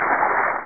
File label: splash LOW; r3 the art of rocketry